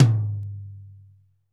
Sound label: tom, Pop Country; Northstar; Drumscapes Roland